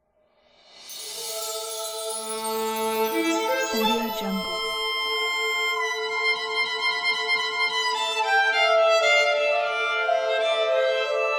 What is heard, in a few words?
rise sun logo